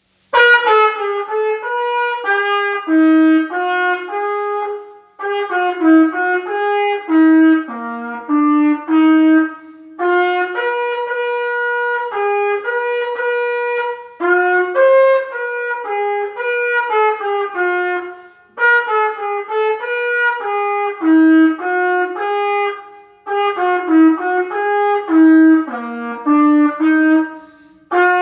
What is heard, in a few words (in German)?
Froehliche Weihnacht ueberall Trompete